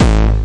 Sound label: BD Distortion, kicks; VEC1